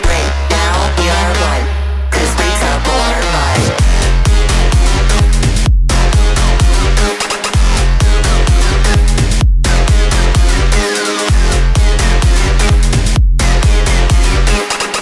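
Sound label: metallic beat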